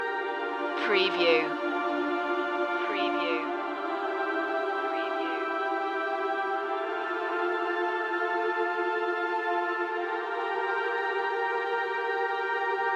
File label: gothic trailer hero loop